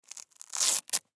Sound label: Hockey Shin Guard, Hockey Shin Guard Velcro Remove; SPORTS